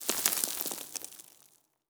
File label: slide stop; aragami